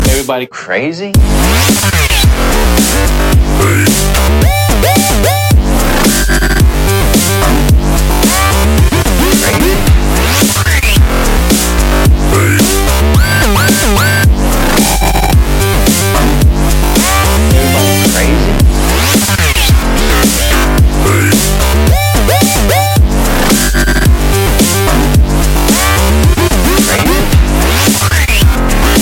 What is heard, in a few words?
Dubstep